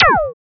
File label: Laser